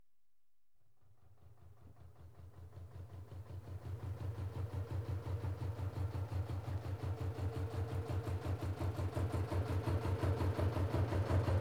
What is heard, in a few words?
Canal Narrow-Boat, Approach; Ships And Boats; BBC Sound Effects Library; Ships And Boats 2